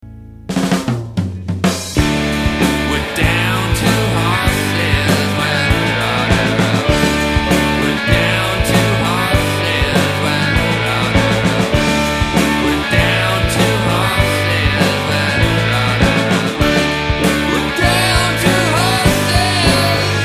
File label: two horses rock drum